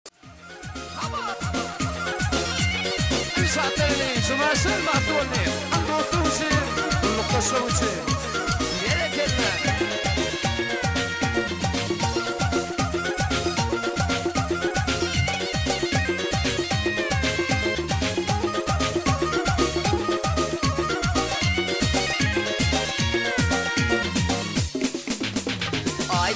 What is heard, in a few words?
turkmen music